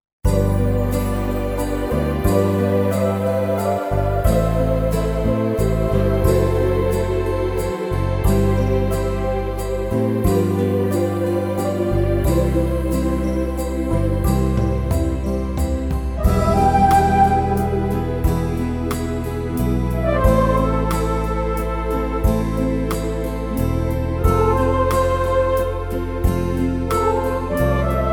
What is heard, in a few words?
Christmas Waltz